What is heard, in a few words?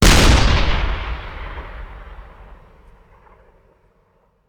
Cannon Tank; Sound Ideas; Guns